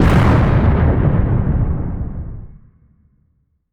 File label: Explosion Medium, Barotrauma Weapons